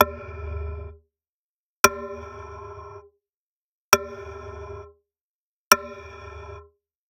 Generic Click Digital

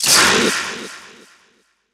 Great Draconic Viper Death; Kilta

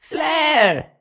Fire; AudioWizards; Saundaman Prime

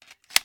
Gun, Machine, Replace Clip; gun and weapon sounds; captain audio; fully loaded

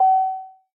bluetooth beep; Blind Drive

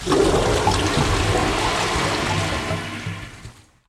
Toilet Flush Clogs; SFX Kit; Foley - Misc

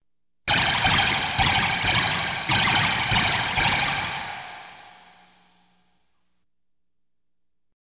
Science Fiction effects, Lasers, Light beams

